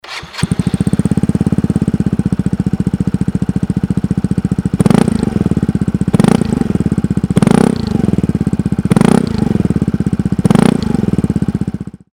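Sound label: 22racing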